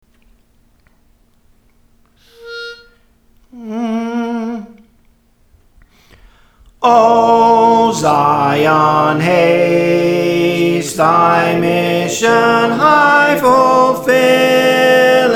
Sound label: Soprano, Songs; O Zion Haste; Praise For The Lord Training